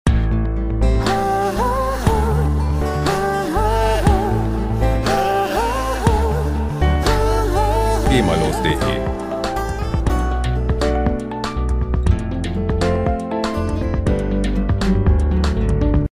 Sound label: ballad